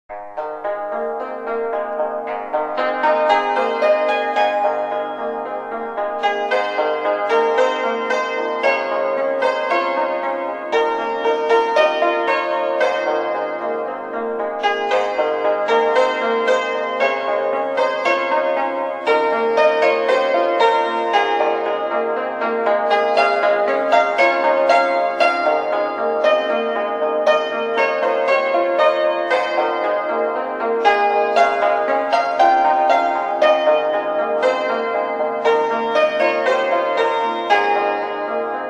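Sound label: hymns and medleys on chinese hammered dulcimer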